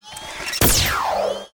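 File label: GRENADE LAUNCHER MIDDLE, Weapon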